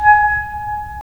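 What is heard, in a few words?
wind clarinet; Roland - LA Composer vol. 3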